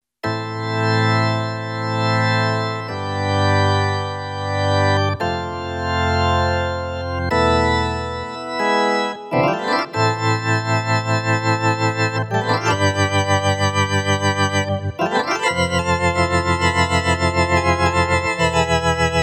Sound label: 60's Organ; Yamaha PSR-550; Voice